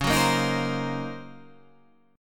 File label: D sharp Minor 13th C sharp, chord